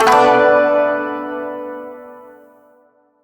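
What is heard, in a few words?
Shawzin Major Chord L; Chords; Warframe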